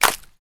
clap single hit D key, Royality free hand clap sound tuned to the D note. Loudest frequency: 4152Hz; percussion; single hits one shots